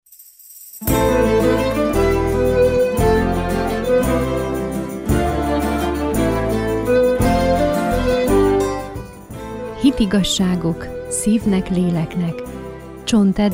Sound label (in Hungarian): Jingle; Hitigazsagok szivnek es leleknek